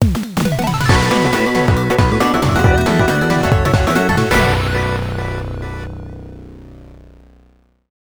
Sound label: Victory Battle; Creatures of Aether